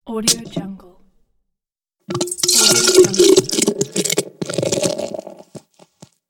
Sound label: beer opened and poured